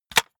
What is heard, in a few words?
cassette case close hard